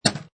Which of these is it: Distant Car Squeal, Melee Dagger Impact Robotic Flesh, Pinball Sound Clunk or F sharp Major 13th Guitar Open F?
Pinball Sound Clunk